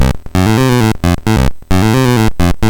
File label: Bass, MOS6581R3; hvsc